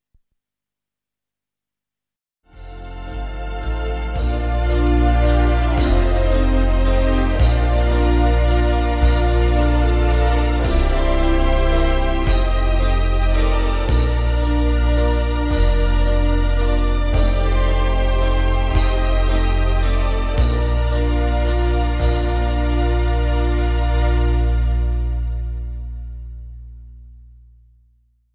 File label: Blowing Bubbles Ending